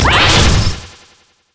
shield; rumble skin03

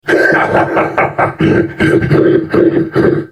laugh; Urgot; en US

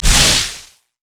lightning rush; LoL; kennen

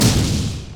dragons rage; LoL; leesin; blindmonk

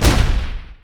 dragons descent; LoL; shyvana

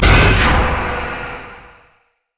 morgana q dark binding; LoL

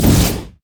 baleful strike

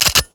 gatling gun; LoL; corki

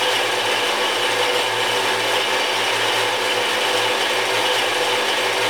rock saw engine loop; LoL; emitters map8; odin

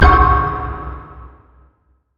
sonar; grandline; nautilus

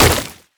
void ooze; LoL; kogmaw

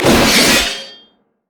axe grab; Darius

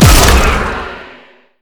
zone knockup; Zyra

